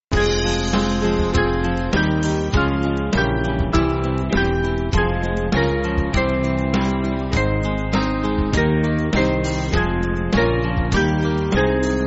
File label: Band